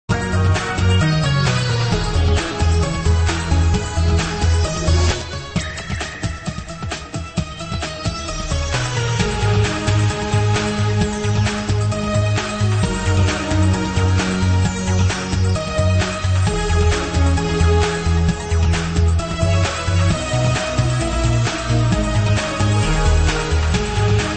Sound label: synth; Delta